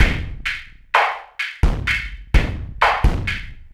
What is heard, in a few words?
CB LOOP; Zero-G; Chemical Beats